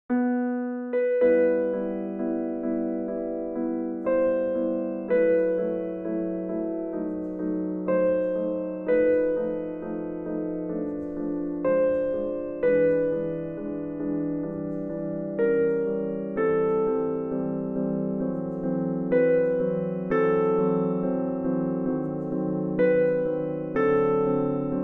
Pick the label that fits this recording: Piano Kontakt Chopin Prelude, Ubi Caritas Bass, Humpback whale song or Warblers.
Piano Kontakt Chopin Prelude